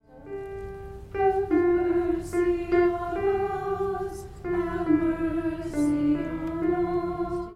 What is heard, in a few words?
Advent Lamb of God altos